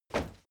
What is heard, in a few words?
step crowd run loud single left, footsteps; schritte